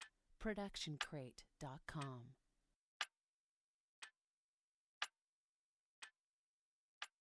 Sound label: Mechanic Clock Ticking; soundscrate